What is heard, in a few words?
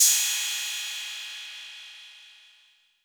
Roland 808 CY; Drums; Tr-808